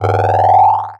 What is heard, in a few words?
Digital; Adobe; Multimedia Internet CD-Rom Flash